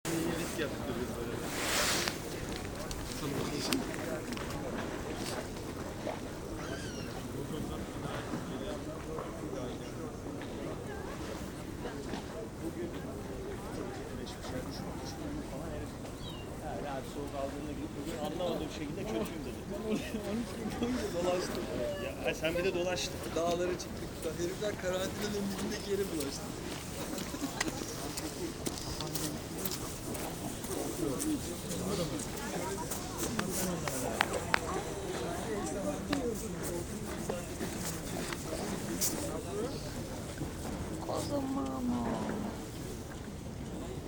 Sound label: caddebostan seashore ambience